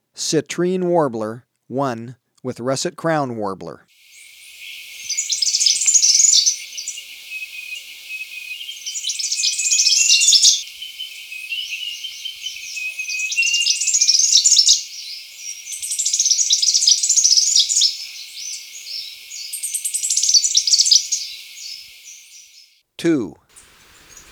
Citrine Warbler, Birds of Ecuador; S. America

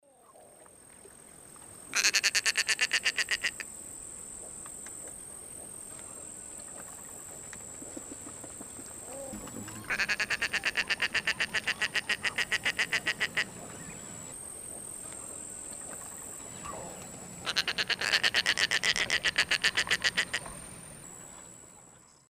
Rostrhamus sociabilis, call; S. America; Eastern Lowlands

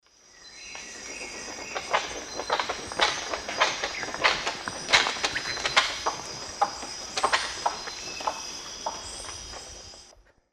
Clypicterus oseryi, flight calls; S. America; Eastern Lowlands

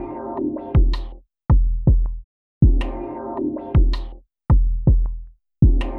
Ceremonial, Tribal Fusion; Ambient Cinematic

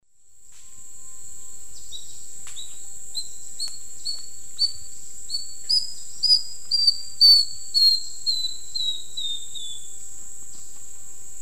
Neopipo cinnamomea, song; S. America; Eastern Lowlands

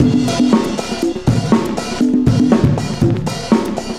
cooling 120, loops, 120bpm

